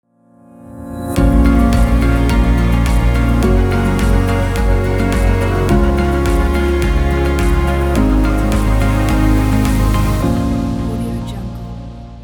motivational logo intro commercial

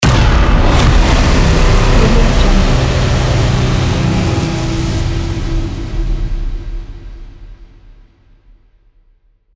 horror tension thriller

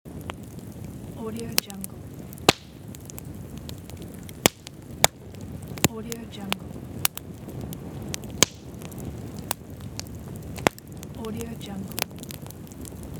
bonfire

